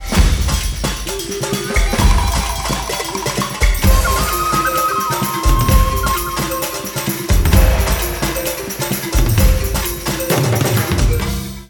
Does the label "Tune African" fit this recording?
Yes